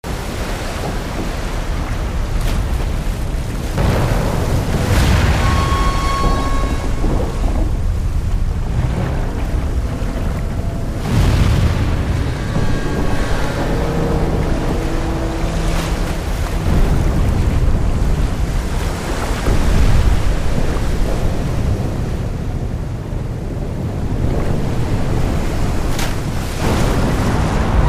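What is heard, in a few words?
Ambience Earthquake Sinking Monument Exterior Loop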